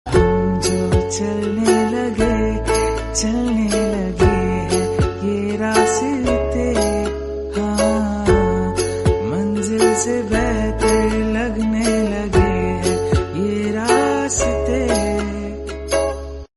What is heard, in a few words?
Ukulele Version